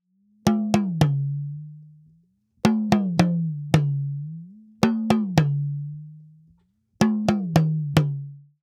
Talking Drum, African

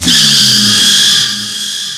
SCRM NOISE; Big Beat Samples & More; AKAI

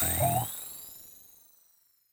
Rhythm

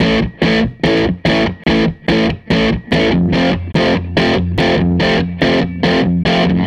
Les Guitar Loop

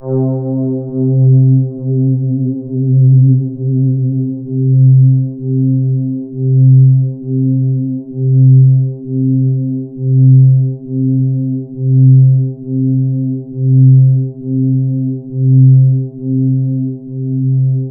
C3, Synth Line; 90 s Sample CDs; NiceBeats; SynthLine VOL-18

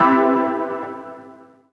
Synth Sound; VEE Synth Shots

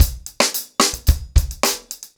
110BPM, ACOUSTIC DRUMS

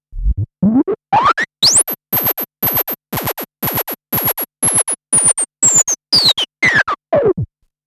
Gated sweep (120BPM); musicradar

